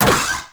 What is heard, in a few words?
Door Open; Railjack